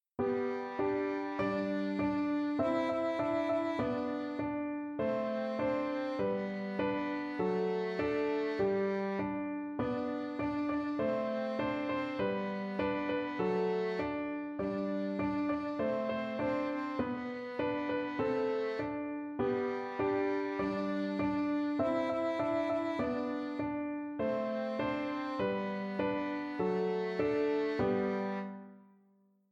Baa Baa Black Sheep Easy Violin Solo and Piano Chords